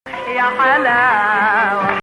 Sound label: Hijaz